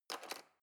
knife wood drawer handle scrape

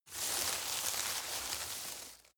grab rustle hard

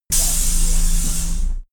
bus hydraulic pfft

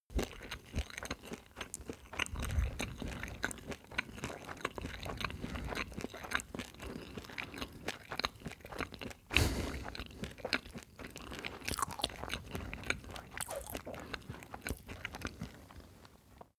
male close eat cereals

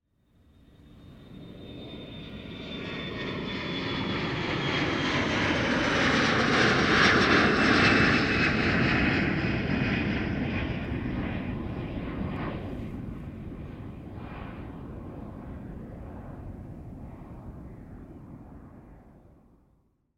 jet passing

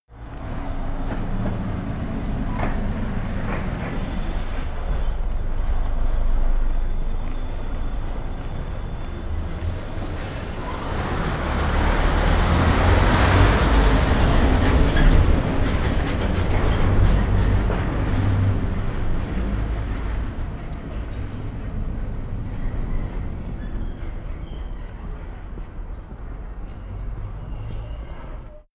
the streets

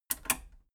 metal button radio click power off fast

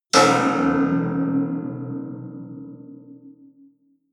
percussion

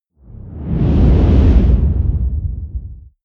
whoosh deep squall heavy

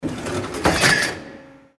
big cutting machine

